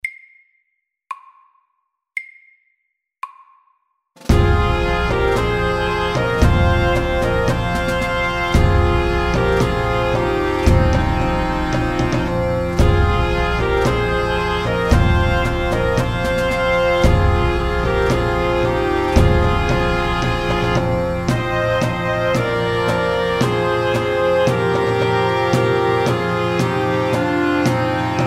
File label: Pipes and drums, 113